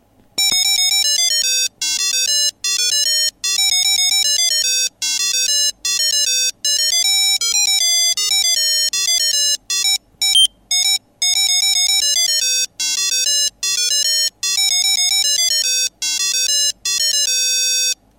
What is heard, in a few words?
melody; avr; schaltungen